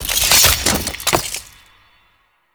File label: Sentient Death Sword; Cinematics; Teshin Intro